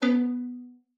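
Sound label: violin pizzicato B5; Xsample VOL-5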